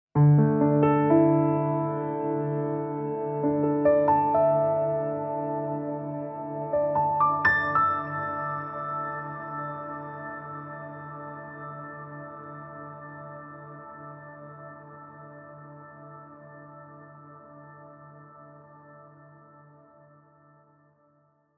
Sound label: piano cathedral; DRP-20